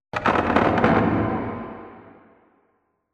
wood creak low; Half Life Alyx

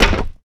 land metal solid, footsteps; player

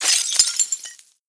debris glass, bullet impact debris

